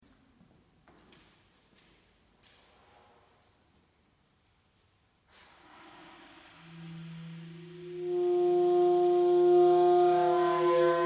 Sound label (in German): Saxophon; Ronnenberg